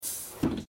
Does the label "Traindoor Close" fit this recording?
Yes